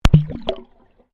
Water Microphone Dunk Shallow Submerged

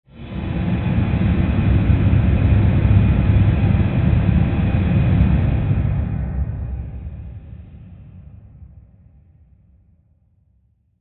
Swell Airy Rush Rumble Long; Sound Design; Swells; Big Room Sound

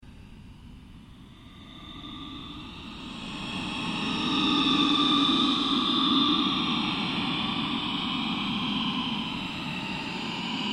Created Undulation Drone; sound building blocks created through manipulation of raw material; Rise And Dive

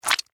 Horror Squish

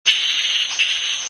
BATS; Partners In Rhyme

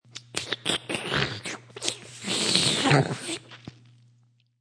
Slurp

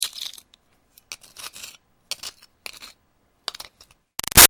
Foley Rock Picking Archaeology Moves; Rocks; Materials

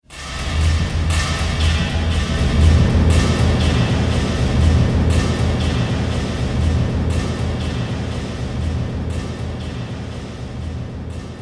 Drums Cinematic Stinger; Sonic Logo Sound Effects

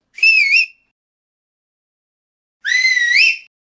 Whistles, 2 x whistles; Whistling; Voice; Sound Effects:Voice

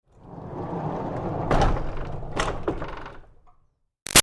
Door Barn Rolling Wood Bang; Barn Doors; Big Room Sound

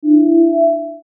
Beep Tone; Multimedia Web Interactive; Beeps (Sound Effects:Multimedia)

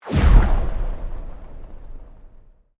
Sweep Mechanical Medium

